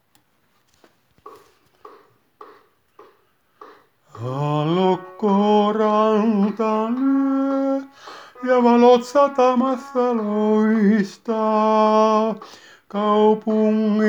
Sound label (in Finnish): Sataman valot tenori